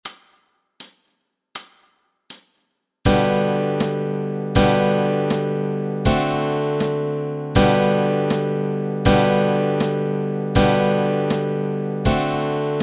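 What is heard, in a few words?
Chords Track, Easy Guitar and Ukulele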